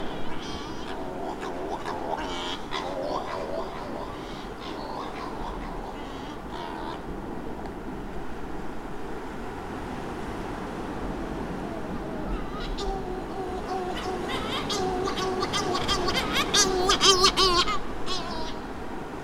Corys Shearwater Calonectris borealis; wilderness songs

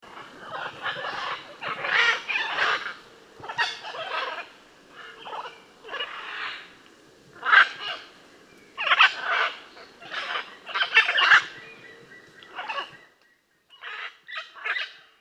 Blue and yellow Macaw Ara ararauna; tropical birdsong

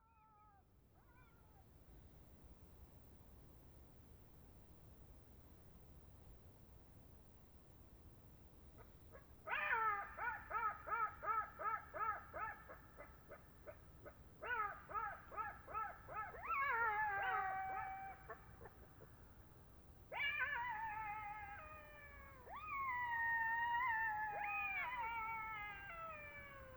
Black backed jackal chorus; wilderness songs

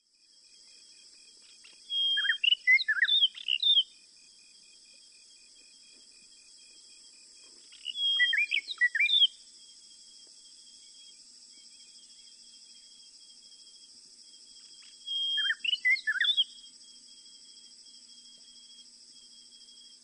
White browed Robin Chat Cossypha heuglini; tropical birdsong

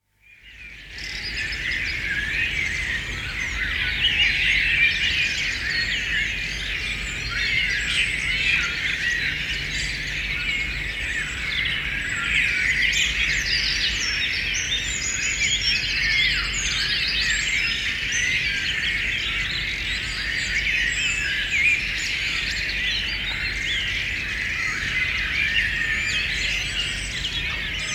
BIRD Song Dawn Chorus Song; wilderness songs; BBC NAT

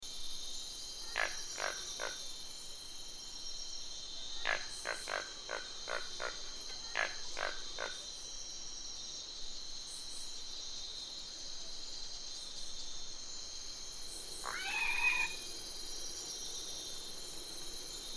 McGREGORS BOWERBIRD; wilderness songs

